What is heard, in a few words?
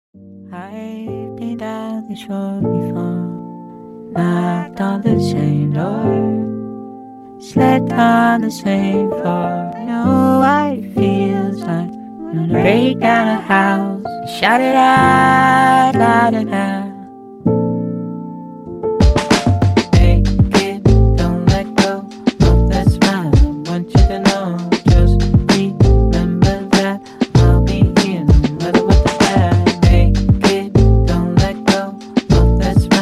Jazz